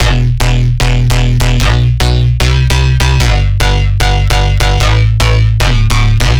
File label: bass loop intro bass 150 Emin; Virtual Riot